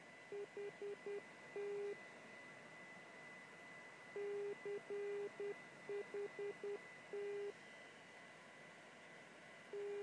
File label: non directional beacon callsign cht in morse code broadcasting on 277 khz; hiltern